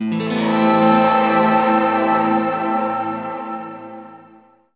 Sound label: one spacey guitar strum